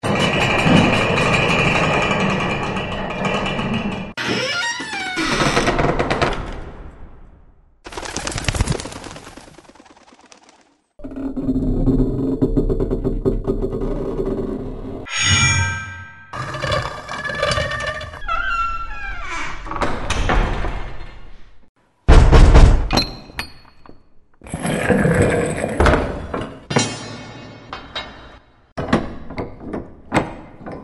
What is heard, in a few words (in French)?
bruitages au chateau